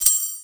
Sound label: SHAKER, INDIAN PERC